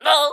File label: Pecheneg Archer Damage